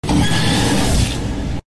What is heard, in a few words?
huggy wuggy jumpscare